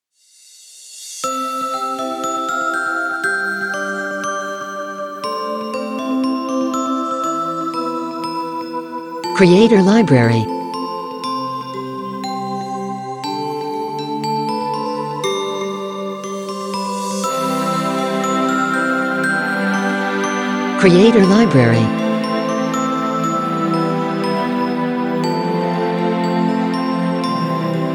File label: Christmas Music